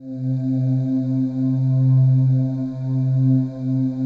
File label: PAD, DIGI-PADS